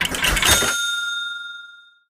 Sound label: dice Roll Loop End; fairyred